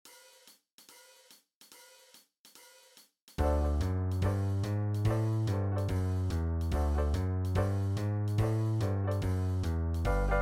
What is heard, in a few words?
Open String Blues